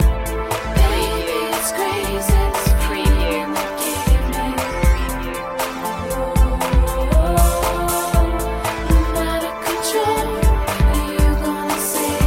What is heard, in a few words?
come save me flash loop